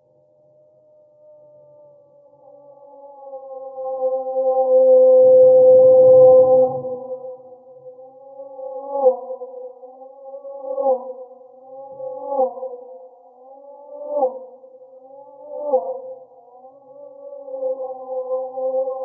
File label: Heli